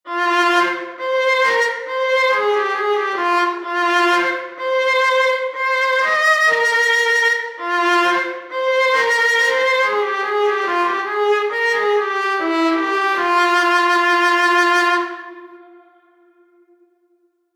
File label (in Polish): Skrzypce elektryczne